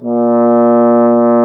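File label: bass trombone; Miroslav Vitous - Solo Instruments VOL-1; 90 s Sample CDs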